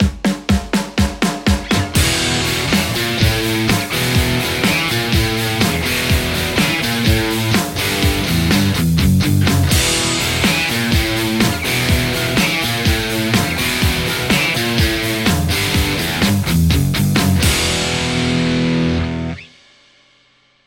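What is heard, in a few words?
Telecaster JCM800 hot; mercuriall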